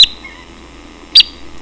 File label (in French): bécasin à long bec